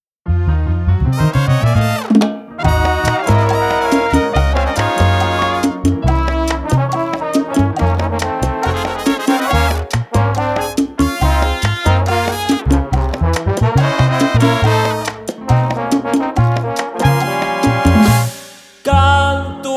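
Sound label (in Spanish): lamento Jarocho; veracruz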